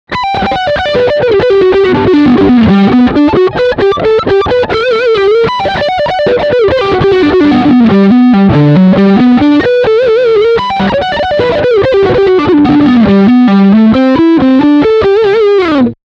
Lick Front pickup

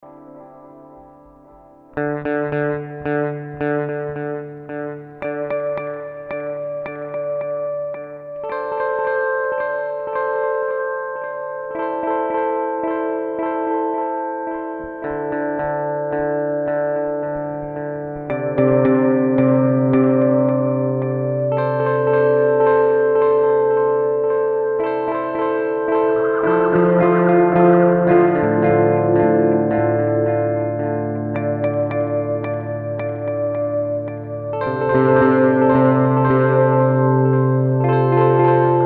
volante tape

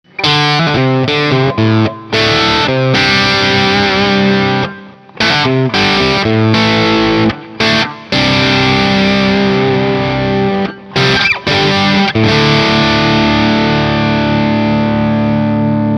Friedman JJ Junior gain

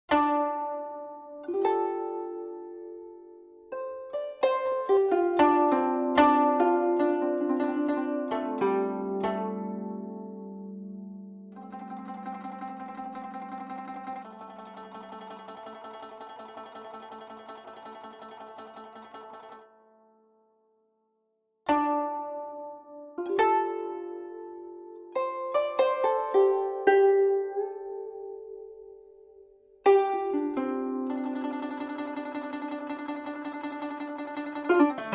Ghuzeng

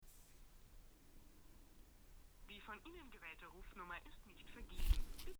telephone; Austrian Audio OC818

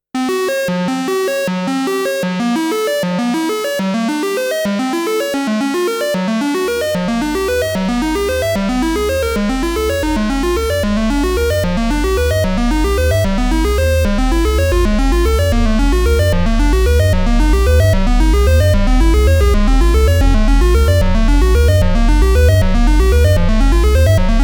Sample And Hold

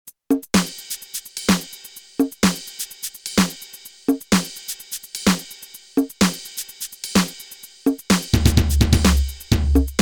beatrepeat

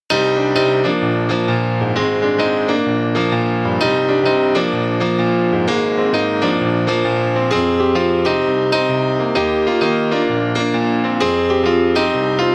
Nord Grand Compressed Pop

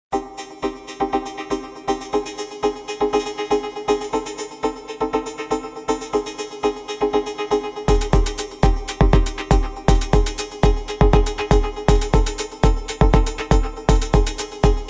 Softube Vocoder vs Korg Drumlogue

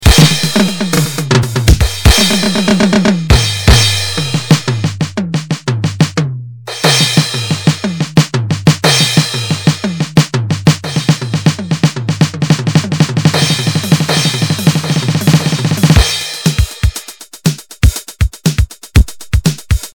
fills toms

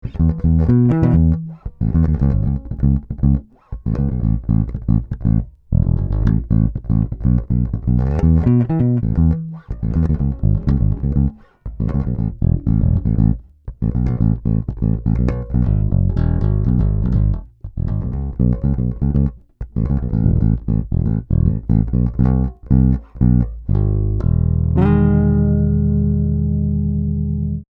Fodera Passiv Neck

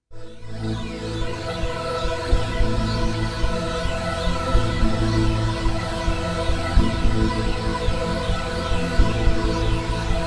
ASM Hydrasynth Strange Peaks

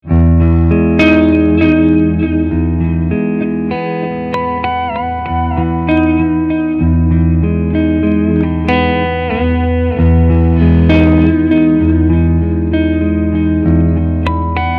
Maximum Delay Time; Reaction

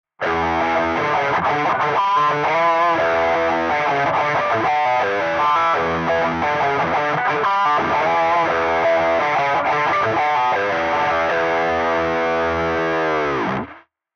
Neural DSP Soldano SLO 100 Telephone Riff

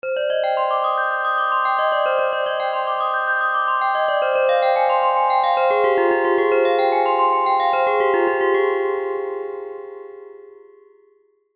Percussion Chimes

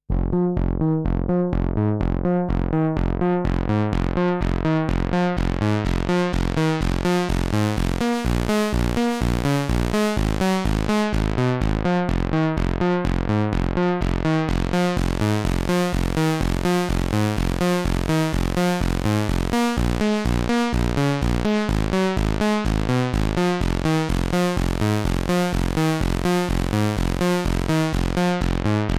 Summit Audio TPA 200B Synth Strong Overdrive

